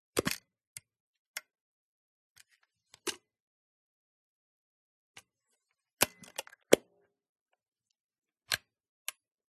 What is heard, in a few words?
Camera Film 35mm SLR CANON A1 Open Close Back Door Film Compartment; MKH8040; PMSFX